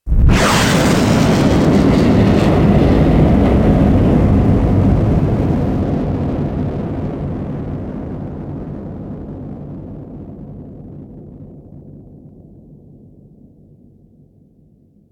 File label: Rocket Shoot